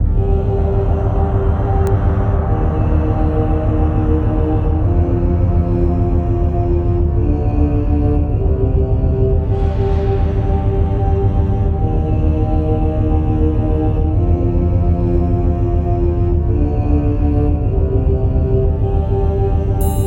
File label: catacombs in game